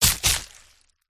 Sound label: cat attack contact